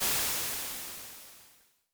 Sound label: Crash Waves, Vermona Mono15 Drums